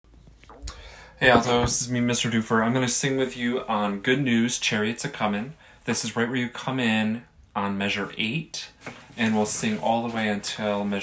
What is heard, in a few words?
good news alto